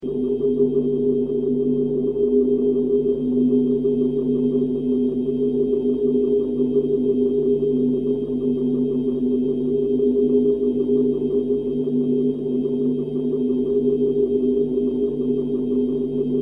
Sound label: Ruins Light Beam Loop; Journey